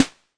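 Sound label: CASIO PT-30 snare drum; LO FI and 8 BIT KITS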